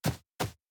Birds Footsteps Loop; Josh Journey Darkness Totems; PS4, Switch, Windows, Xbox One gamerip

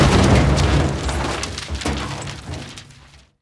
Building Particle Falls On Floor

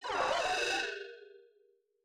aquarium boss voice Parried; unsighted; enemies

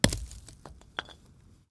Rock Fall

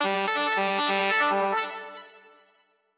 Sax; Special-Photo Impossible-Triangle

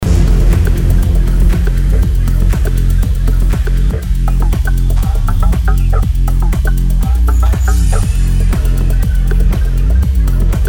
Robot factory music

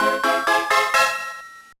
Fanfare Creepy

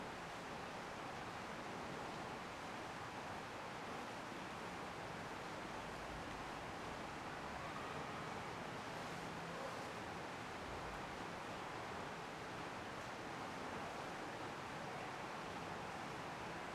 Ambience Hotel Base

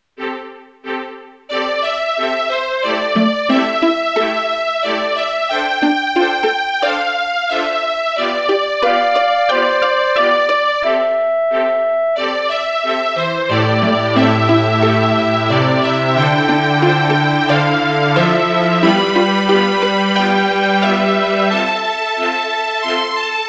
Ring Tone (Poly); Smeshariki: Kaleidoscope of Games

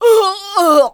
Enemy Generic Human Death Crushed

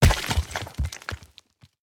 Pillar Destroy; unsighted

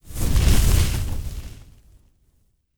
Armor Passiv

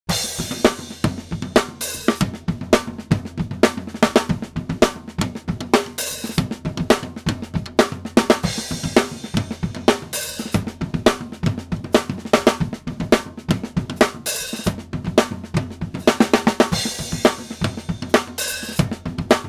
drum play music; gamerip; The Sims 2

